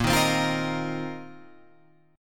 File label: A sharp Suspended 2nd Suspended 4th A sharp, Guitar, Open F; strummed; A#sus2sus4; JGuitar